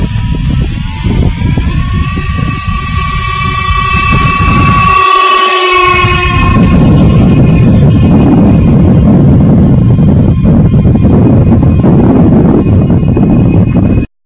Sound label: learjet takeoff